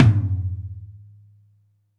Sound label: DM5 Tom Hi, Alesis DM5 drum machine